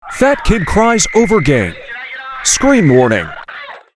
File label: kid cries over game scream